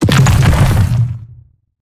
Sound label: Rock Throw Impact Swt; Evolve; Meteor Goliath